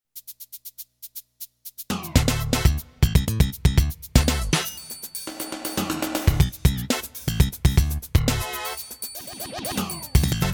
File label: funky